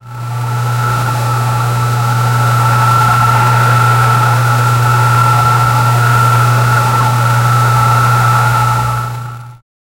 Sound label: a tennis ball machine fir